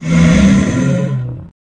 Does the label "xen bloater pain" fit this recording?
Yes